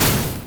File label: range projectile impact; weapons; dota; creep